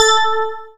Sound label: digi decay